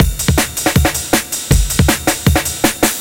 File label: drums, Hardcore Breakbeat; Big Fish Audio; Hardcore Breakbeat Action